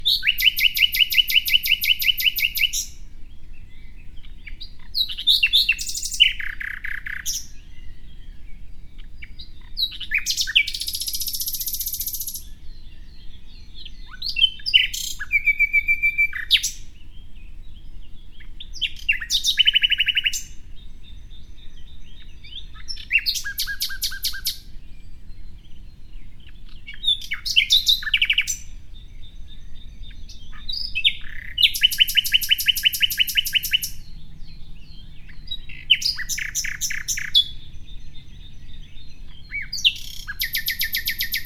Whistle Tone

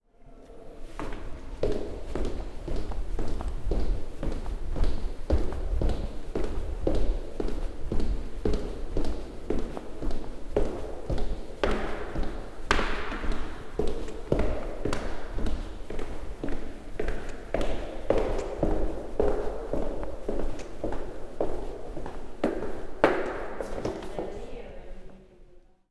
Steps In A Long Corridor

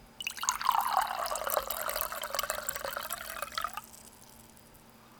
Pour whiskey

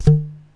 Pipe

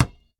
Basketball

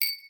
Ding

